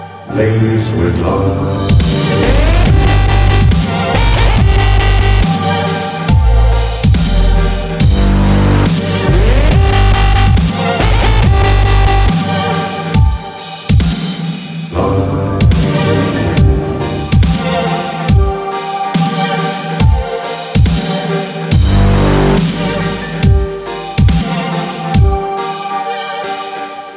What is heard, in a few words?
dubstep; Hobbit